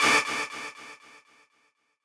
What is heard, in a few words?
stab